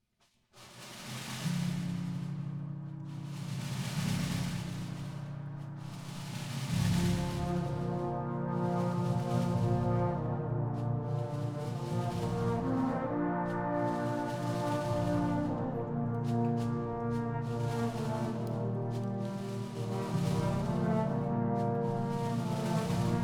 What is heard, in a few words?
Wind Symphony; The Ocean Springs High School Wind Symphony